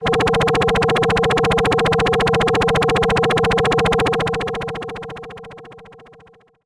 Helicopter FX DX100 D4

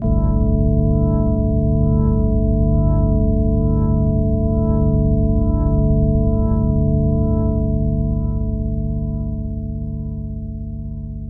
PAD Organ; Professor Malbadors

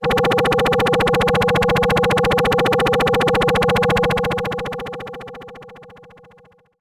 Helicopter FX DX100 Ds4

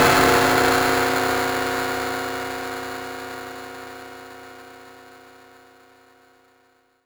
Cymbal

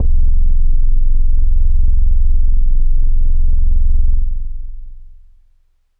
Leslie F1; organ; Kawaii

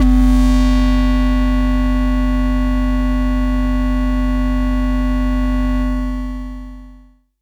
SYNTH Werewolf Slower Lower

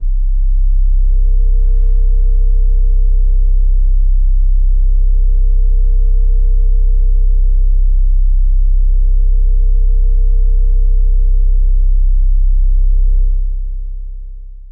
White Siren R C0; pad; Kawaii

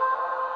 Ice Cave Trumpet C4

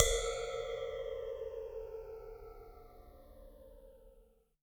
Ride Acoustic Lofi Phaser

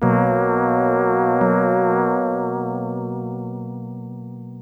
Synth Chord

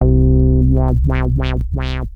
BASS Double Smooth Tweaking

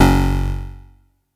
atari 520st

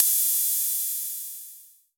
HAT Or Ride Maybe

